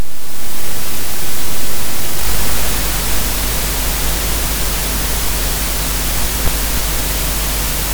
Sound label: audacity off computer screen with magnetic pick up